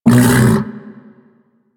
Vocal Tranq Grunt; Warframe; Hunting Critters; Undazoa

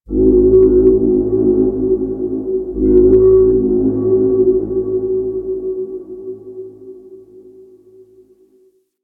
Ocean Fog Horn; Warframe; Grineer Ocean

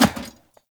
Heavy Grunt Boot Walk Metal, footsteps; Characters; Enemy; Sinecure

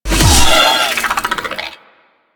Dive Bomb Attack; Enemies; Abilities; Sentient Brachiolyst

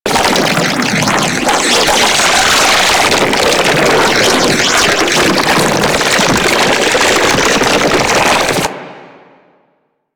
Slinky Beam Loop; Warframe; Man In The Wall

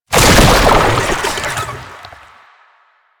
Infested Roll End; Warframe

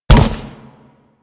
Step Metal, Footsteps; Warframe; Grineer

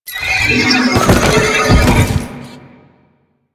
Horse Death Front; Vehicles; Duviri; Idles

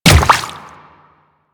Water Step, Footsteps; Warframe; Grineer Royal Guard

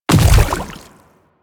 Infested Splitter Land Water, footsteps; Warframe; Grey Strain

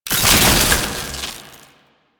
Grenade Pickup Electricity; Alchemy; Warframe; Entrati

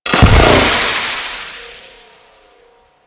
Force Field Hit C; Warframe; Ambience; Tenno

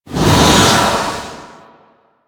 Tear Spawn; Warframe; Entrati; Swarm

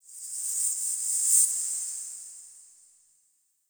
Crypt Bell Jingle High E; Ambience; One Offs; Champion Crypt

